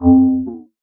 Grid Stab F, Psytrance; Grid Stabs

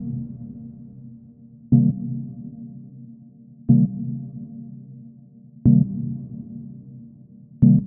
House Stab Loop Cm 122; Deep House; Techno; Ghosthack; AC2023 - Day 17 - Dub Techno & Deep House Chords

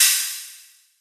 Riddim Ride; One Shots; Hats & Cymbals; Ghosthack; Riddim Essentials 2020

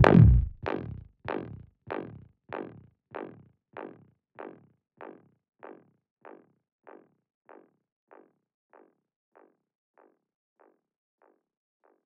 Squelch F# 145 BPM, Psytrance; Ghosthack; Psytrance Visions Volume 2

